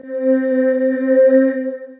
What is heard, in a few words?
Choir Gothic; Ableton; Factory Packs; Retro Synths; P-50 Linear; P-50 Structures; P-50 Partials